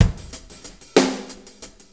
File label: 6-8 Loop, Full Kit Loops; Drum Tools